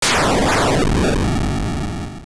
Boss Explode; A boss exploding after death; Zelda Oracle

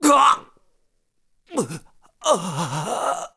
Die; Link dying; zelda sounds; Skyward Sword

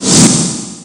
Vanish Reappear; Sheik; Zelda

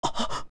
Big Gasp; Link getting surprised; Adult Link

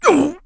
Sumo Grabbed; Sumo match: Bo grabbed by Link

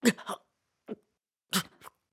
Die; BOTW; Breath of the Wild; Link

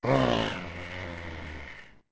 Grumble; Tijo grumbling

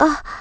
Light Gasp; Uli gasping lightly

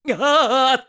Balance Ball; Skyward Sword

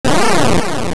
Boss Hit; Hit a boss

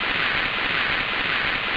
Rain Out; Zelda; LTTP

